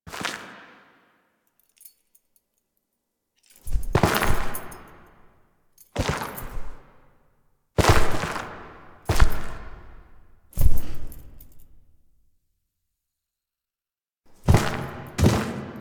Throne Room Foley; Intro Throne Room; Warframe; The New War

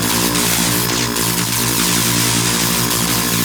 Ground Laser Loop; Enemies; Abilities; Warframe; Teralyst; Bigalyst

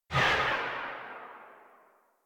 Tail Ext, Weapons; Supra Vandal

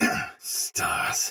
damage low chance soldier sword agent male; Dialog; Barks; Battle; Melee; Sinecure

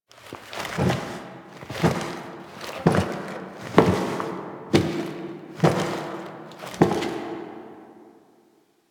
Steps; Operator Nursery Stalker; Warframe; Orbiter Nursery

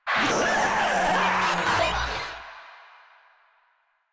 Vocal Attack; Warframe; Proto Infested Runner

